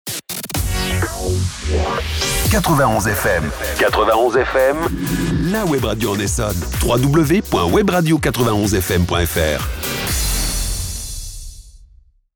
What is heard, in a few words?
Sweep ID; 91 FM; Essonne